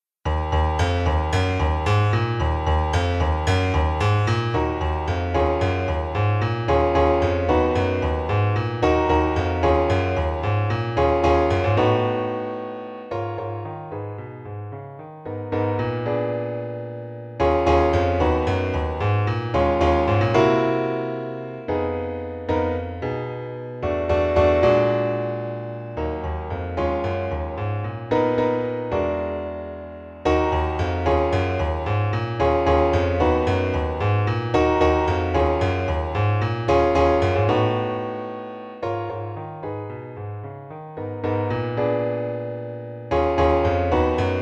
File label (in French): Mechanical blues Piano seul; Jazz